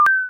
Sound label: bling